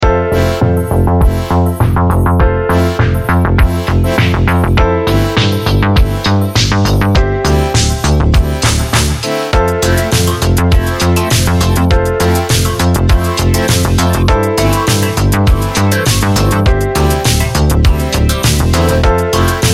Chillout; Vertex Impress M

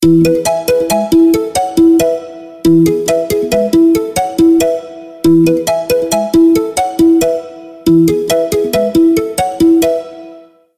Wind Whisper, ringtones; Tecno Spark 7

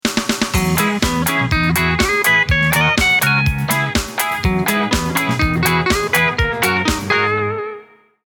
Happy beat; Sony Ericsson W960

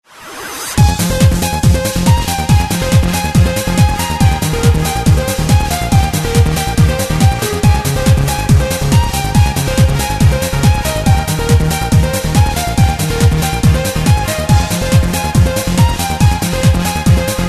Tech Beat, ringtones; Motorola ZN5 T-Mobile

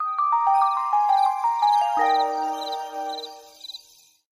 Shutdown; LG LN272 Rumor Reflex; Power Up-Down; Rumor Reflex ic

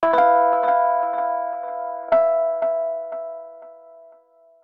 Mild, notifications; Explay Golf

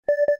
Failure; LG L-03A

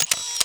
camera click; RED Hydrogen One

